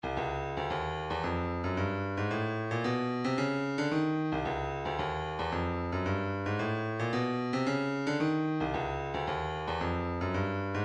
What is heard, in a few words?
rim, midi; 112 tribulation